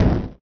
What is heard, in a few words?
special fireball end; Cinder